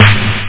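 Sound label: snare drum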